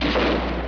creature menu command

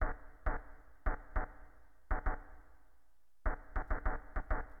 STAB, AcidJazz Funk Fx; LiveAct Pool

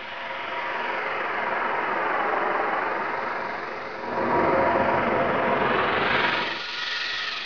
dome energy rush; environ; Gunlok

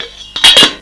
pot; Little Red Riding Hood

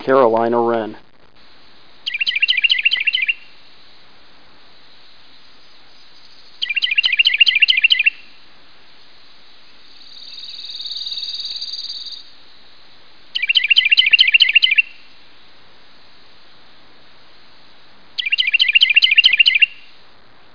WREN SONG, Birds, Coastal Louisiana; Explore Coastal Louisiana with Boudreaux

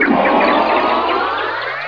Ghost Death; BOOM

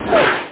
MISSILE; F1 Licenseware - Volume 1

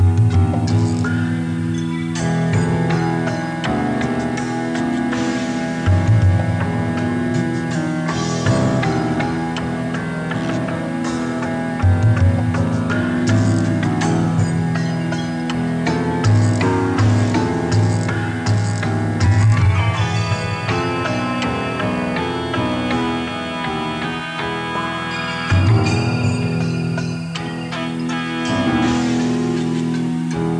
creepy music; How Would You Survive (1995); Grolier

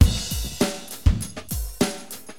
DM LOOP, HipHop, Drums; LiveAct Pool; AcidJazz Funk

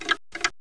tictoc

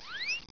whoopie; 12000 Graphics Pack - A Complete Graphics Collection For Your PC

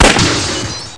Shoot Screen; Blood Bath 1.6 Demo

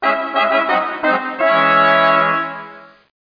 lose; Lords2

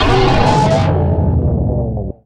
soul pain; darwinia

